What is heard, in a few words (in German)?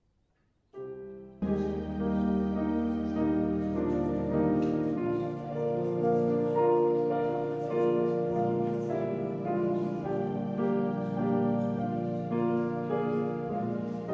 Vorspiel